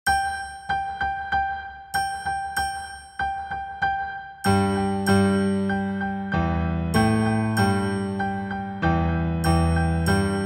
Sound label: Fast Accompaniment; Its Raining